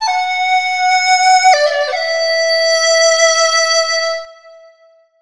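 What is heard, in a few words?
Chinese FLUTE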